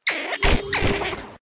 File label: Wombat, pets; vombat